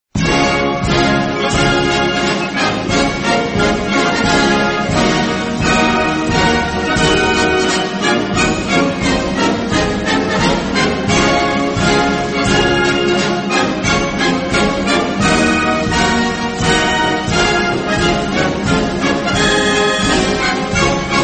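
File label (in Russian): muzyka placa Vstrechnyj marsh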